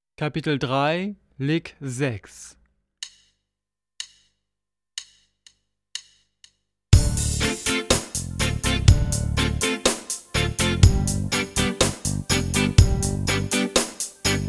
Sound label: Latin Style Guitar